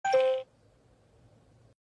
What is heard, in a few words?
Notification Sound